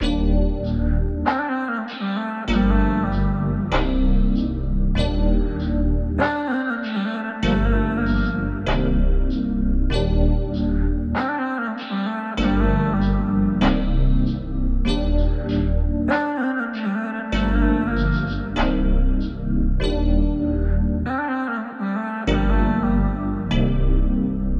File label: 97 BPM G Min, Hip Hop Melodies, R&B; Desires